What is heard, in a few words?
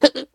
jump; Scrat